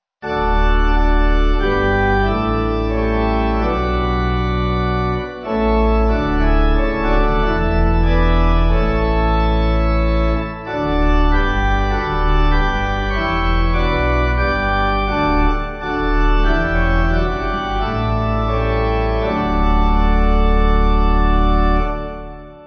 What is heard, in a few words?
Pipe